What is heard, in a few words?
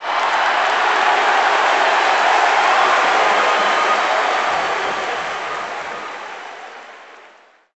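Basketball Crowd Reaction; Crowds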